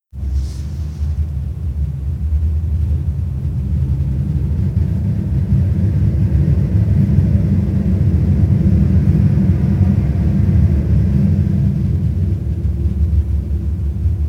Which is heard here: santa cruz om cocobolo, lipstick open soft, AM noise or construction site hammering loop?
AM noise